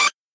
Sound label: build up lead